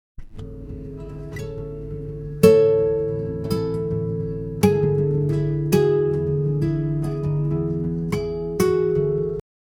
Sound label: acoustic guitar